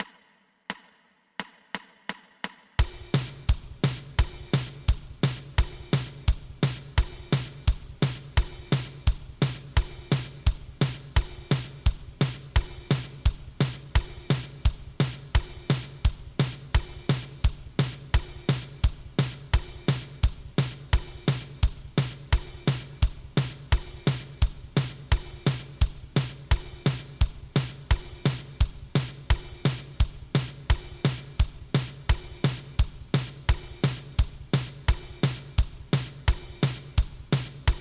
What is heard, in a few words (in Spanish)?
2 pulsos